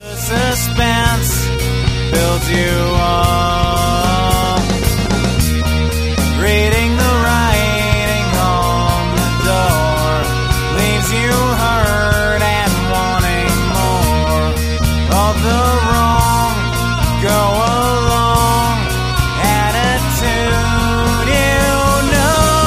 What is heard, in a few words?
fail